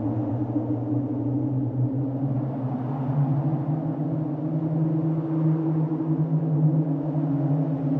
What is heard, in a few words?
ambience graveyard; everness